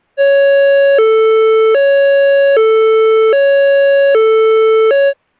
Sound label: Euro Police II Slow Alternating High and Low 554Hz and 440Hz